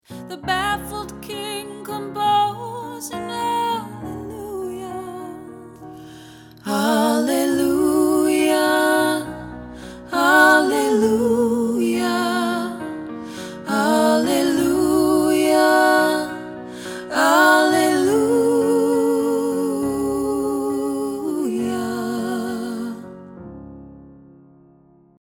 Tenor Practice Track

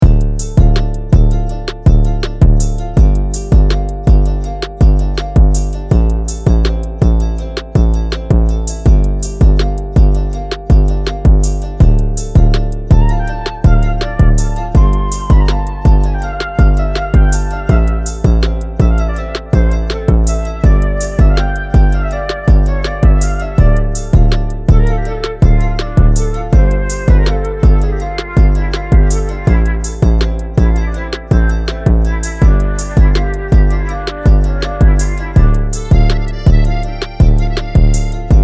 Beat

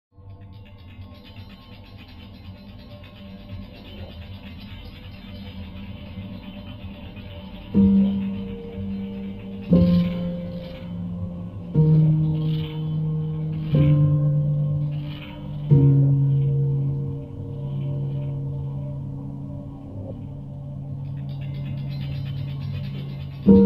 Ghost Rythm

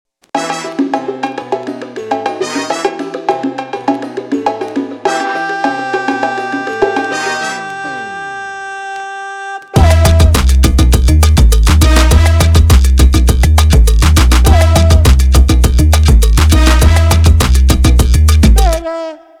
LATIN SALSA CLUB; Latin Salsa night Club 60's-modern

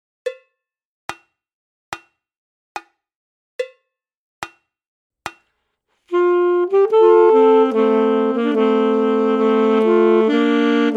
Duett IV, Mill Mill O, Scottish; Dynamic Duos for Sax

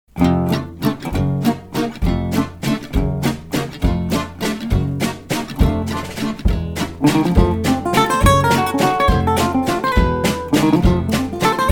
waltz

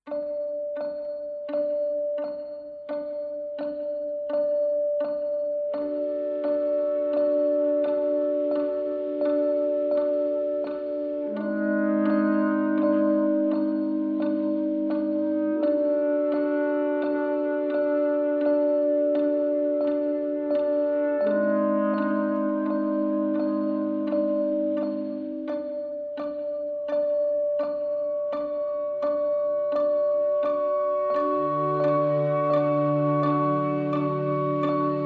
Inverse low hiss

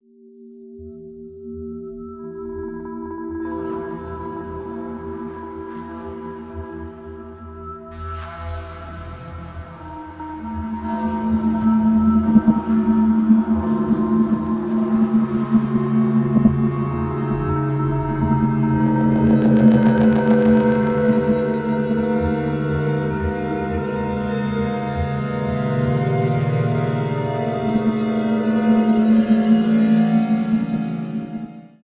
Alien Landscape

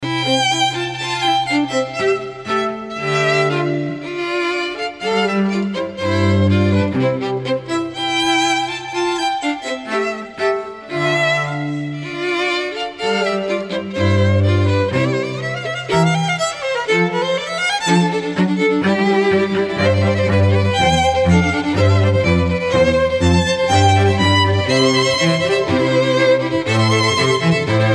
Alleluia, String Trio; Mozart; Exsultate Jubilate

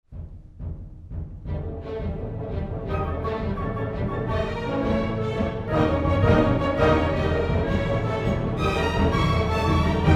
Fanfare

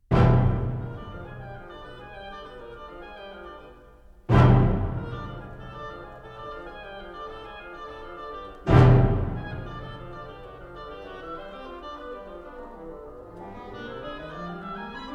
Orchestra; Stravinsky Psalms 1; Craft

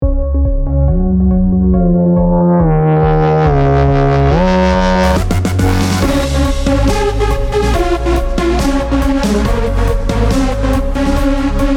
Pop Runner EDM

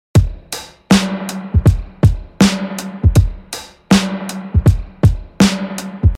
SPRING DRUM LOOP BASIC DA DUM DUM HAT LIFT

